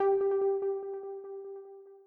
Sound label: EPiano